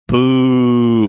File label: booing